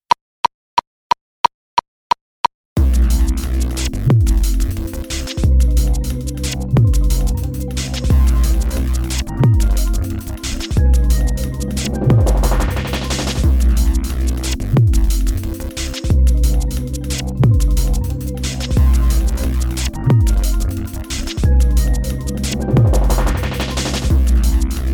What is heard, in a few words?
126 techno play along